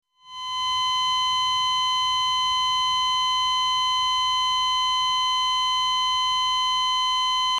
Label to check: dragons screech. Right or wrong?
Wrong